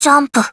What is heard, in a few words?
jump; blanc